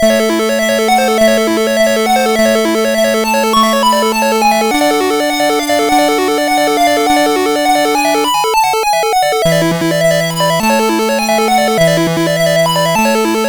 Estudio Brillant, MOS6581R4; sid

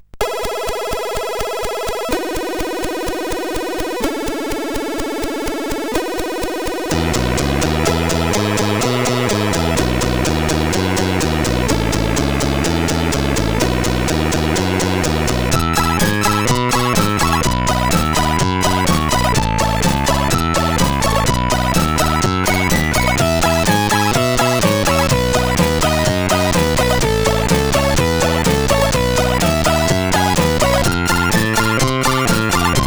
Happy sid CSG8580R5

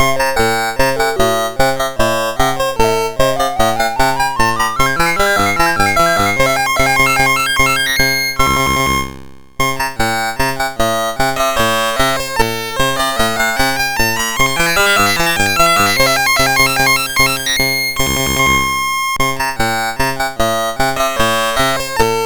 sid MOS6581R4, GAMES; Accolades Comics

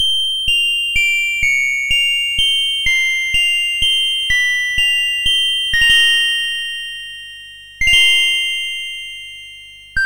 Game Music, MOS6581R4

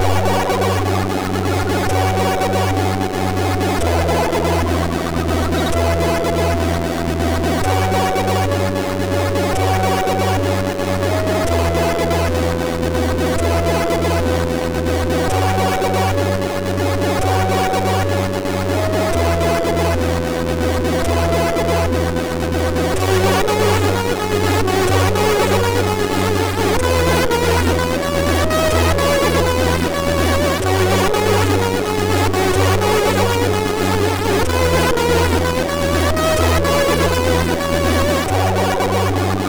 Crack Intro, sid, MOS6581R2; Success Crack Intro 29; hvsc

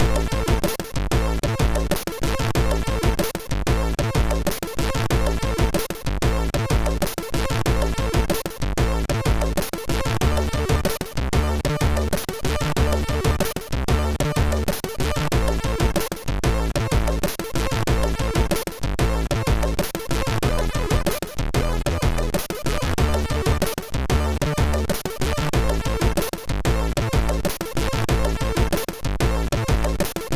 Tune, sid MOS6581R3

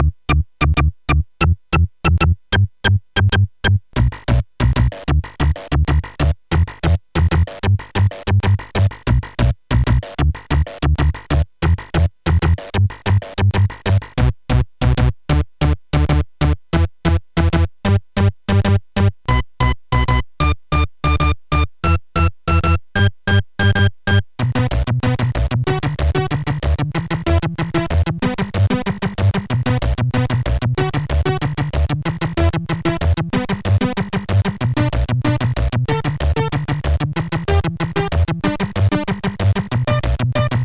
Space Bass

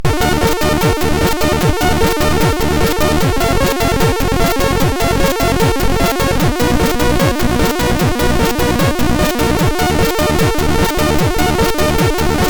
MicroTrance sid MOS6581R4

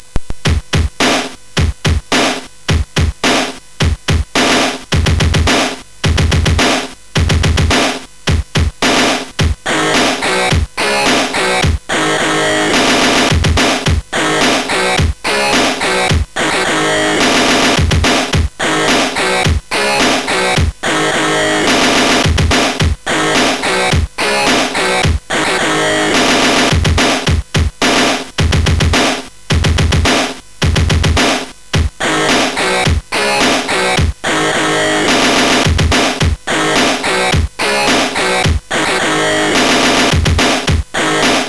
Heavy Beat, sid CSG8580R5

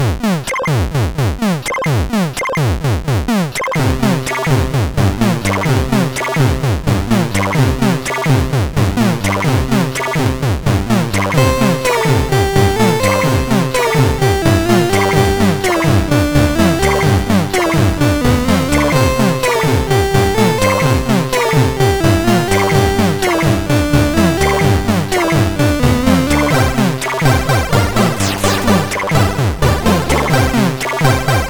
Music Samples, sid, CSG8580R5

